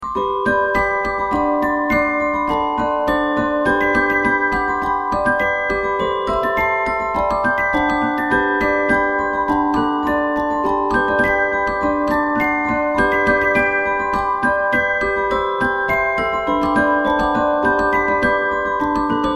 The magic flute (glockenspiel), 36 tonen muziekbestanden; Reuge; W.A. Mozart